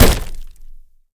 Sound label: Door Close Meat; MiSide